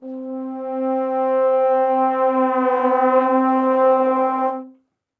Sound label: MPE Erosion Slide & Pressure, Brass Quartet; Ableton; Factory Packs; Spitfire Audio